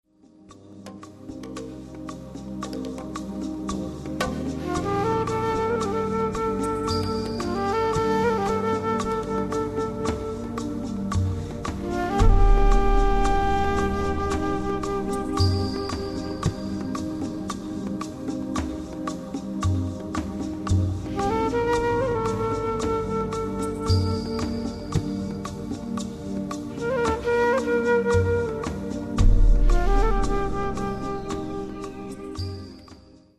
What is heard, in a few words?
mono, Silent Flute